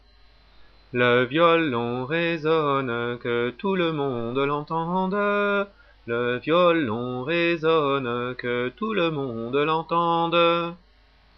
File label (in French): violon